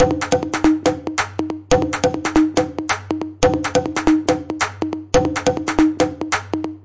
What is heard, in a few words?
140 thin hard percussion, drum loops and breaks